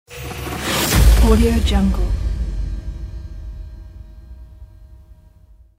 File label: whoosh hit; archimusic